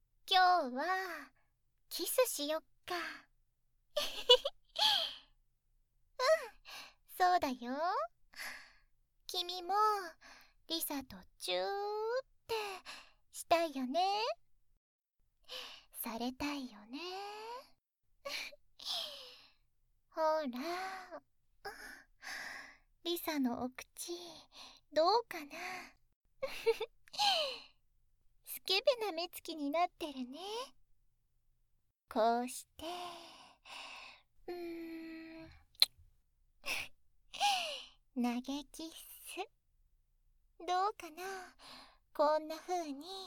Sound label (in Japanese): キストラック